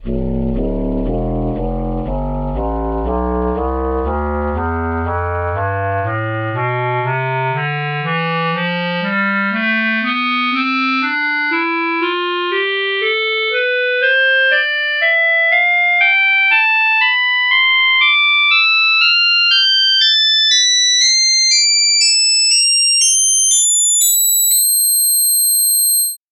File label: clarinet tonleiter